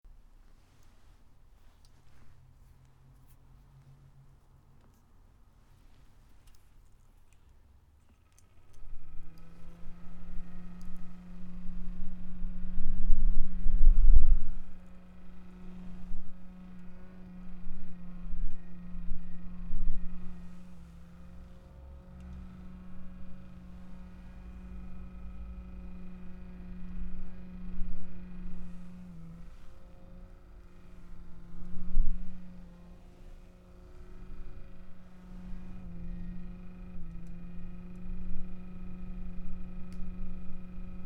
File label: Fan Noise